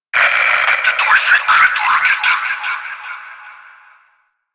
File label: Fortress Exterior Notification; Ambience; Warframe; Grineer Fortress